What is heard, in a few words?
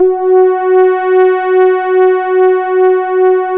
BELLY F#3; Best Service Alpha Dance vol.2; RESO